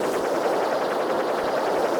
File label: boss laser shoot; spider-man-mysterio-rush-game